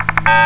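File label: clunk beep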